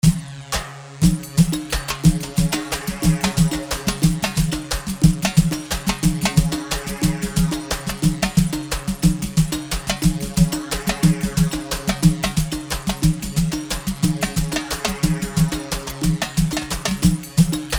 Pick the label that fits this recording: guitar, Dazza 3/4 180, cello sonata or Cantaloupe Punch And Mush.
Dazza 3/4 180